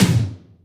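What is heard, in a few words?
tom, Boss DR660, mt; drum machine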